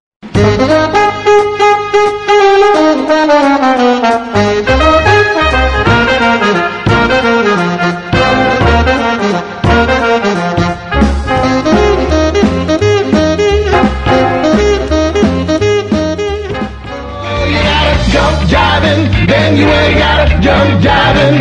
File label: jump Swing